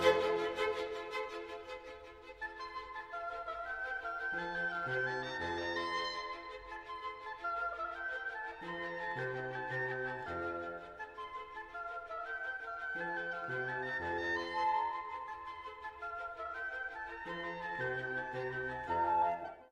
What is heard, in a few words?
oboe solo; beethoven 6